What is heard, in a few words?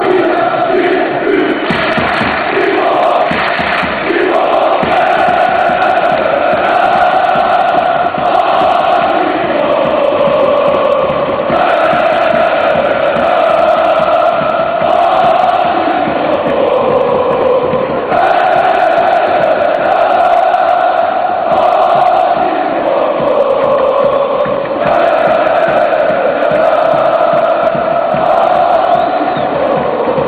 goal cimbombom